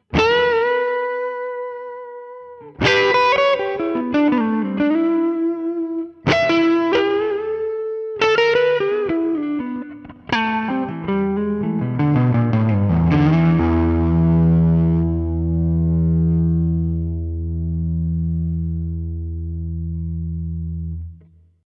blue ballad

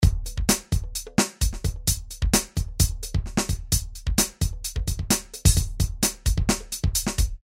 harsh

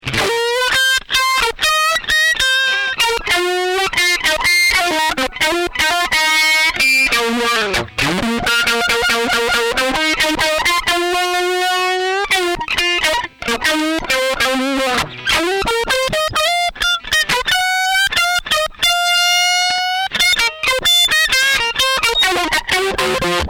Rock Squeeze Tube Zipper

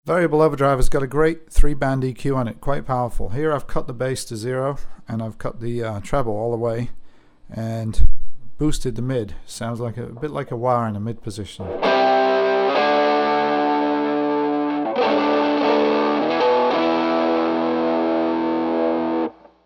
EQ Midboost

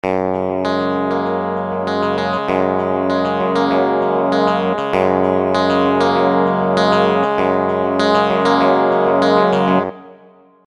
Electric Guitar Stretch

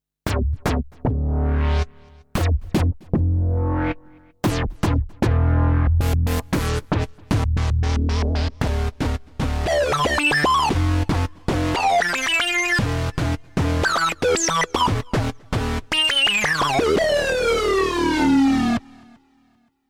Sync Lead

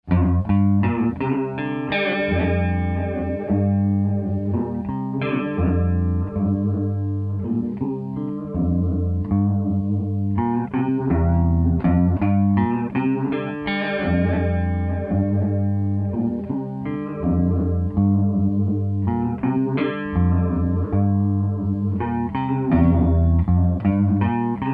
Lo wobble

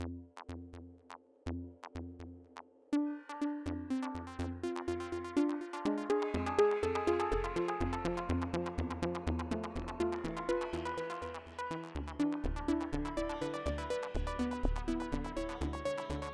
Arp

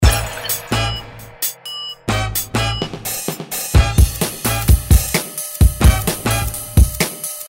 big beat

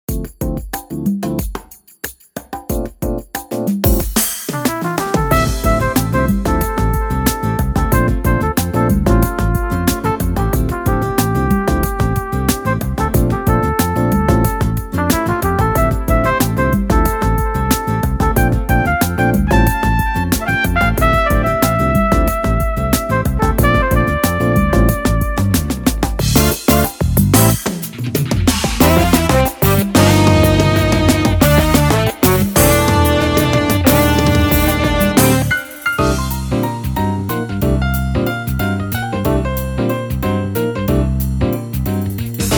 Pa800 World

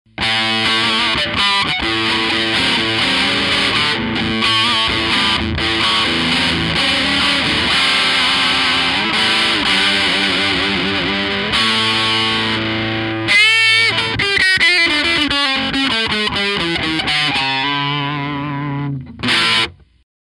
tweed e dog sound

